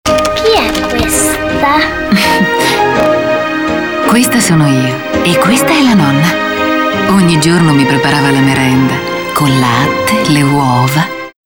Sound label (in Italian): flauti sognante; mulino bianco